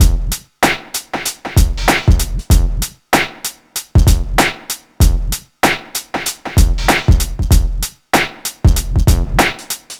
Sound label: 96 bpm drum groove f key, kick tuned to the F note